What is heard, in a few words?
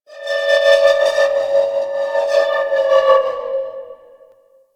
Distant Metal Textures, Asteroid; Ambience; Warframe; Grineer Asteroid